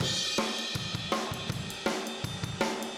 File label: Room M, Drums, 162 BPM; Drums from the Big Room The Mixes; Take Your Toms Off; Chorus 01